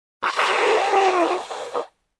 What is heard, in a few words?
Nose Blowing